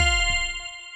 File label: high sound